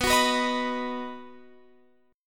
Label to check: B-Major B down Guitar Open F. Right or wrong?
Right